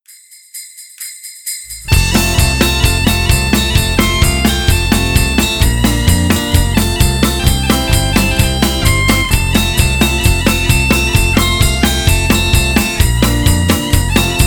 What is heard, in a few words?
Jingle Bells instrumental